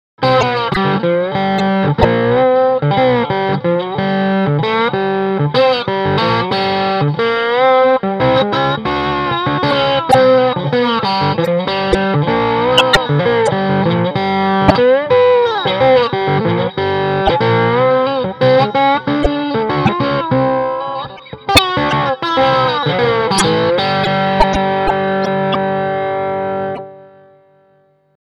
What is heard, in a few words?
Stratocaster; Mercury Box